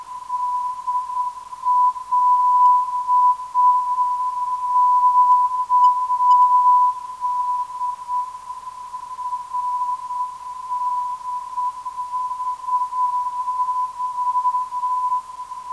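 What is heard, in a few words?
419kHz, non-directional beacon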